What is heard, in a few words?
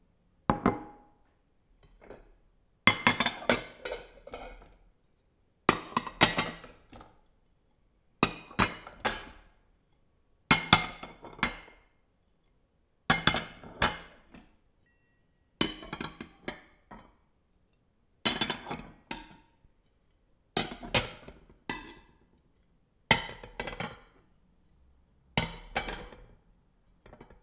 Plate